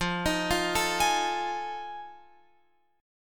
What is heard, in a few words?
F 7th Sharp 9th, Guitar, Open F; F7#9; arpeggiated; x,12,15,12,12,15